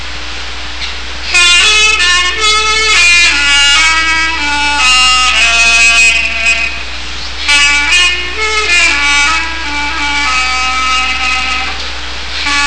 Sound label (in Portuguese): clarinete